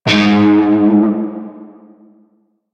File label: Shawzin Flourish Oct One G Sharp; Music; Warframe; Narmer Shawzin